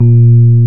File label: PNO, Rhodes Mk.V; Keyboards of The 60's and 70's